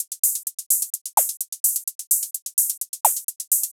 tech house top loop 128 bpm; 2 bars

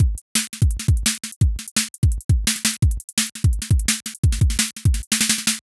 fast jungle breakbeat loop 170bpm 4 bars

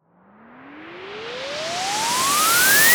white noise riser fx

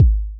boom bap kick hit

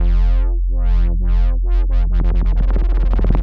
140 bpm heavy dubstep wobble bass 2 bars

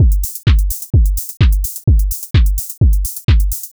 128 bpm tech house drum loop 2 bars

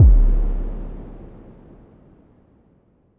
dark cinematic impact hit

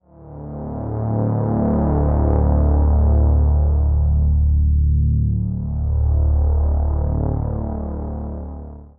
dark cinematic synth drone

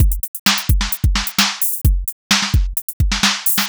crunchy breaks loop 130bpm 2 bars

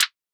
disco clap and snap one shot

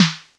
lo fi hip hop snare hit

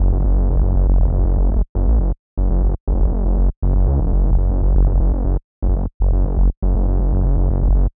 120 bpm 4 bars glitchy reese bassline in fm

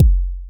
heavy kick

